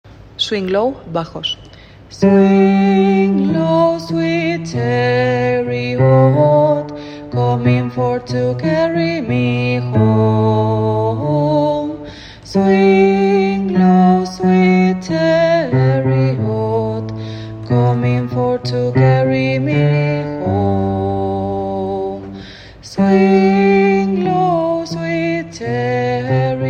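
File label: swing low bass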